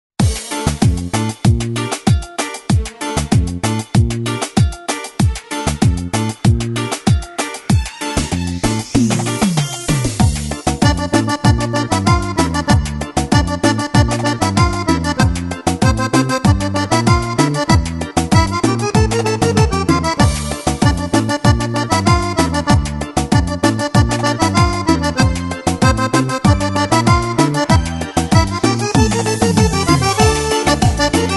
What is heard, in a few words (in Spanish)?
Cumbiando remix